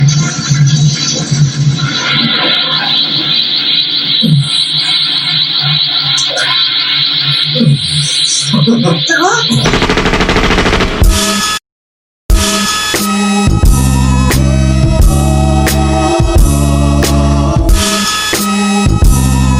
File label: Type Beat Instrumental beats